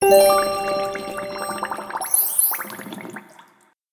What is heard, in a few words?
knock